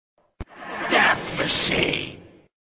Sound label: death machine